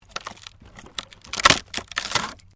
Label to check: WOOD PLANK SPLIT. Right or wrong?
Right